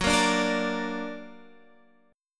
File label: Gb Augmented, Guitar, Standard; strummed